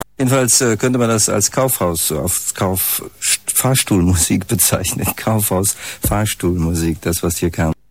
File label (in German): Fahrstuhlmusik